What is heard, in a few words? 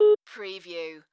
Atm Machine Beep; sound effects bible